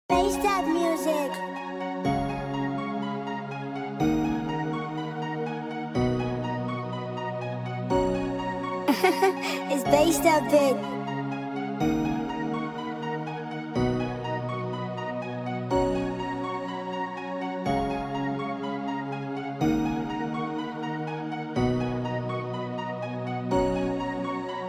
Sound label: Instrumental Acoustic